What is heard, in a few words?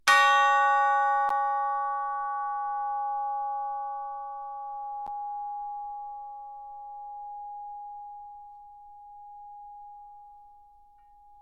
Chimes C 3 Ff